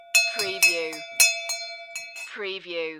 Bell Ring Multi

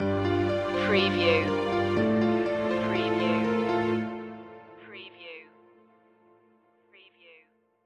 stinger

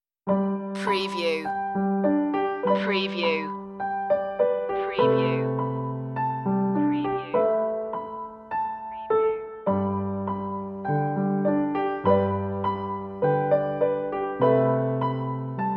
simple joys piano and strings

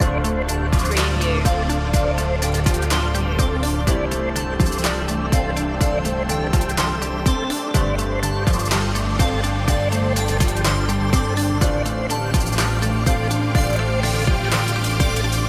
future waves loop

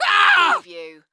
Scream Male Short; sound effects bible

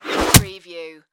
Arrow Impact Human

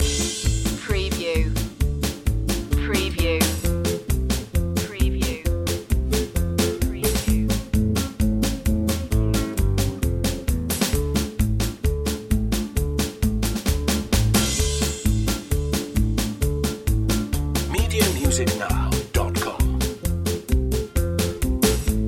polka loop

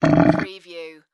Sink Drain Gurgle; sound effects bible

